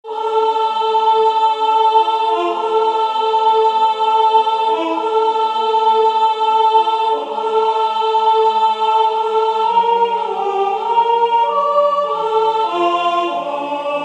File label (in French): canon de la paix soprane alto